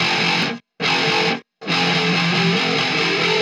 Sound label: Loop